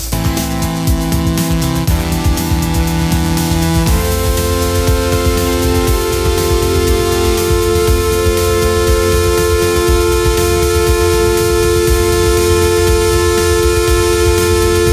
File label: pop anthem; ai-generated